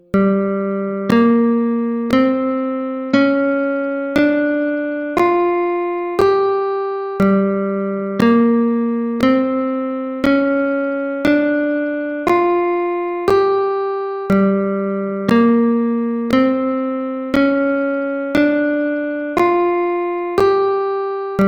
G Blues Scale

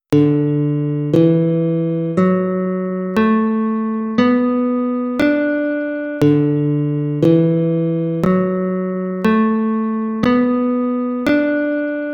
D Major Pentatonic Scale Guitar

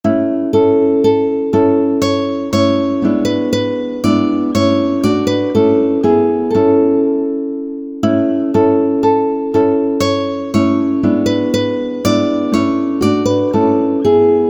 guitar; Hier liegt vor deiner Majestaet

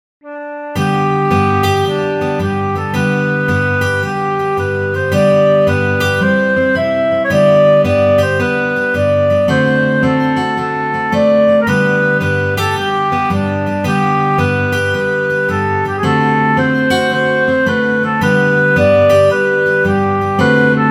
Sankt Martin guitar

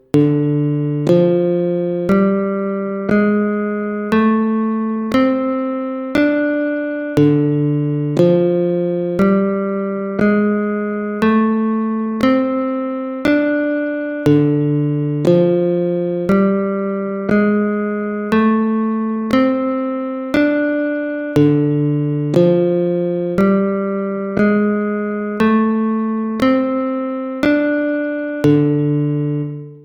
D Blues Scale

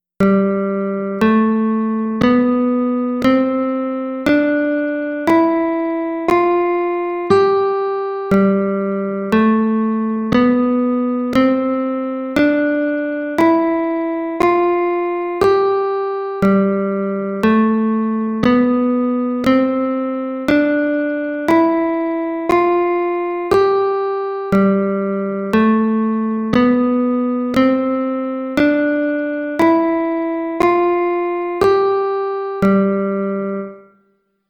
G Mixolydisch Scale Guitar

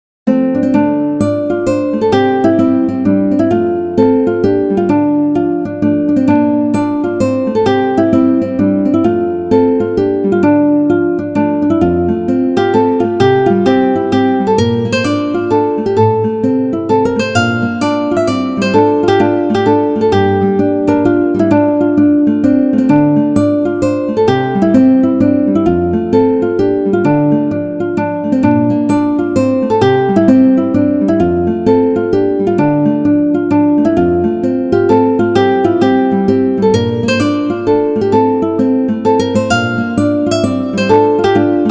The Cuckoo guitar

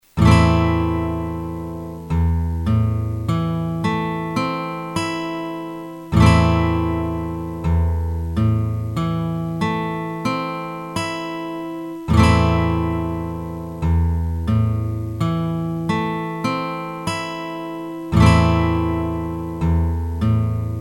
am guitar

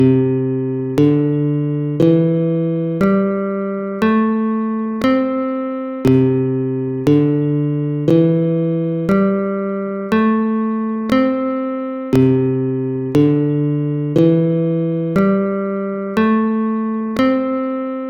C Major Pentatonic Scale Guitar

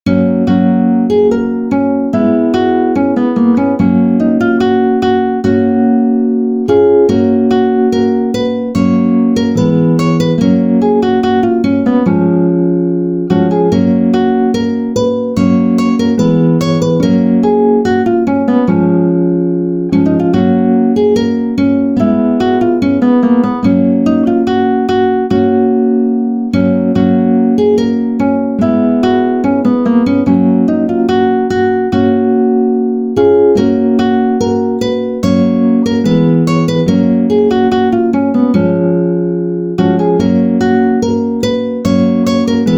The Irish Girl, guitar

